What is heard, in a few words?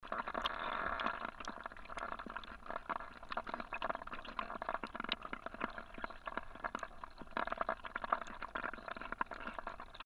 Water; Rhythm; Gallus/Frankfurt; Christoph Collenberg